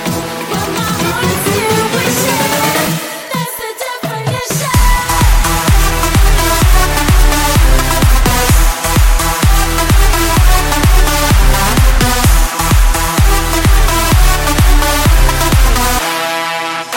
Awesome Drop

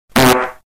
Fart; Bog Boss

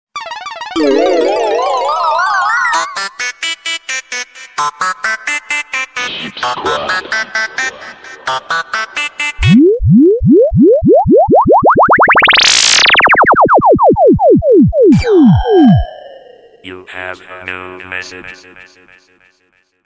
Sci-fi full

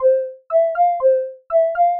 Cheerful Alarm

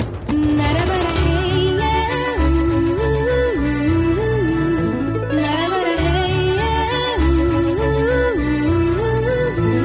Humming

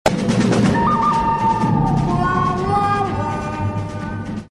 Western Sound

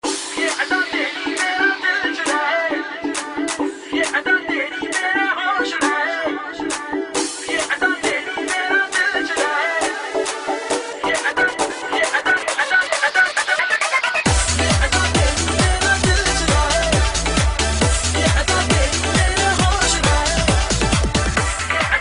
Jingle; Ufone

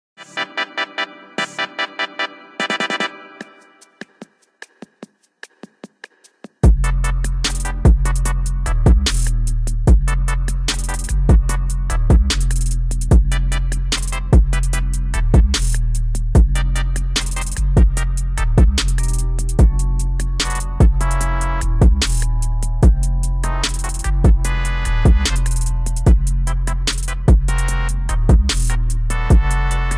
intro bass

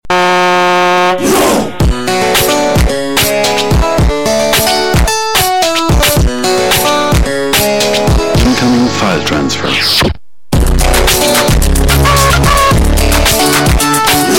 New Hip Hop

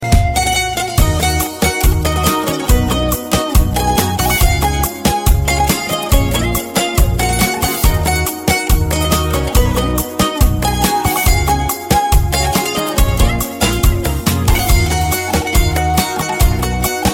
Greek Music

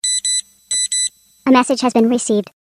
Chipmunk Digital

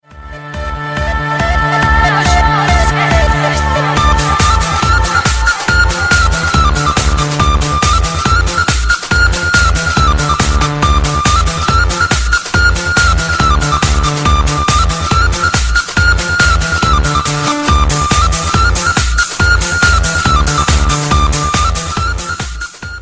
Techno Ringtone

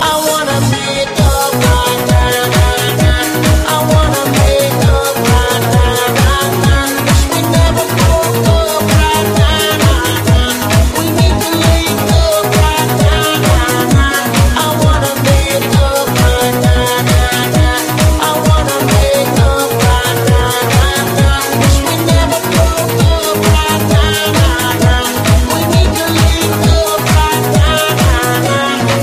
Trance Mix

